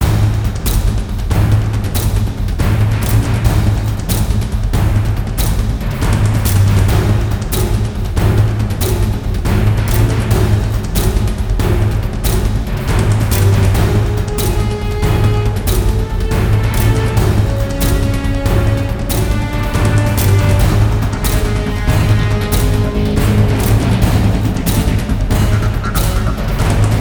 chase; Quake 4 Alpha

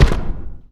step; Quake 4 Alpha; id software; strogg; tik

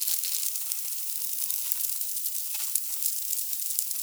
cryo loop; Quake 4 Alpha; ambience cryo

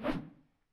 Imp Attack Whoosh, imp fireball; Doom

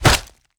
Bullet hit body; DoomRTX; impact flesh

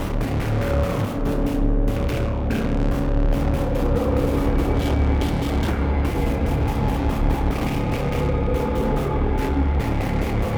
main menu, music; Quake 4 Alpha

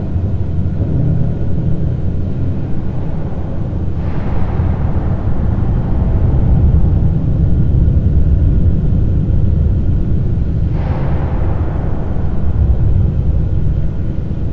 industrial; Quake 4 Alpha

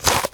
Imp Claws Tear, Imp Claws tear Flesh; Foley

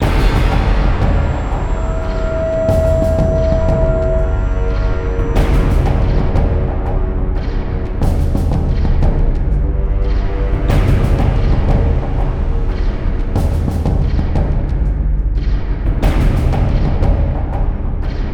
music; Quake 4 Alpha; prison breach

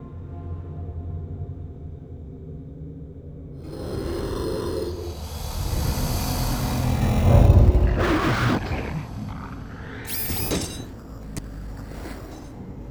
bathroom; DoomRTX

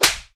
slap, impact flesh; Doom

